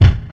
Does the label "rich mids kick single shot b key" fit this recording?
Yes